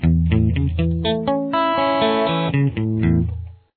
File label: arpeggio A open, guitar; CAGED Open Arpeggio Shapes